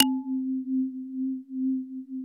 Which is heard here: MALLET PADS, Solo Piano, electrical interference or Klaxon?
MALLET PADS